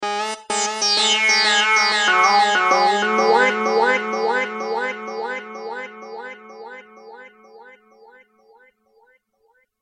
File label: bass lead; sound design hardware